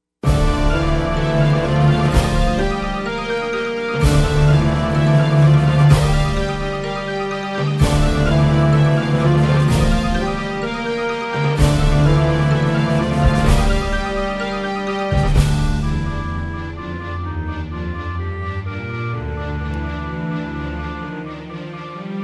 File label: Fast Suspense, orchestral